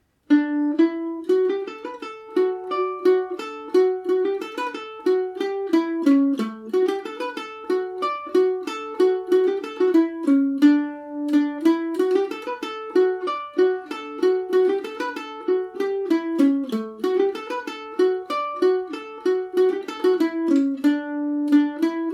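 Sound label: dog that caught the car D major, irish mandolin; (no repeat); Paris Swing Macaferri mandolin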